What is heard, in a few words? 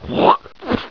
SPIT